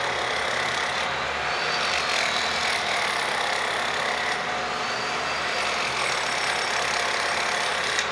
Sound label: build; Mafie 1